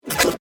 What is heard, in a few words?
Multimedia Action, Technology, Multimedia, Action, Element; Alert Sound Effects; Alerts (Sound Effects:Multimedia); BlastWave